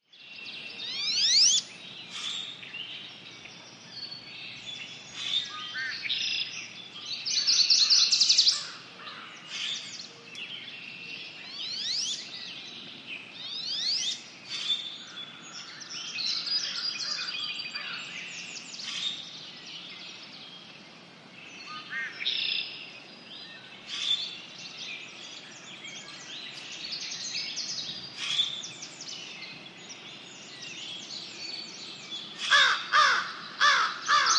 ANIMALS-BIRD BGS BIRDS: Swamp atmosphere - wood ducks, crow, rusty blackbird, mallards.